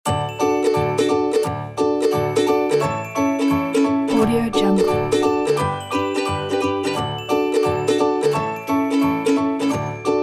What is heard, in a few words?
happy family